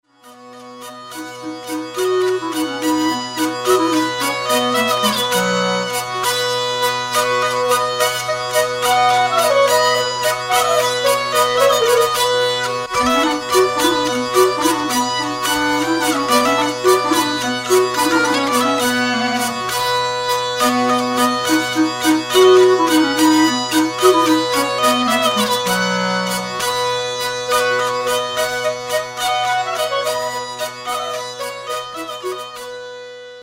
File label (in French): menuet en musette